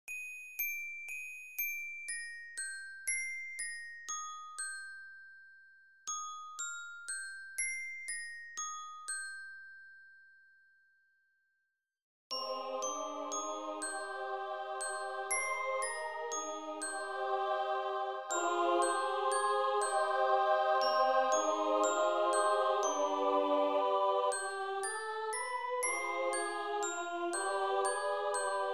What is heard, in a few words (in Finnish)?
Kantele soi